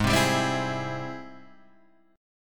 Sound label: G sharp Augmented Major 7th G sharp, guitar; G#+M7 Chord {4 3 5 5 5 4} strummed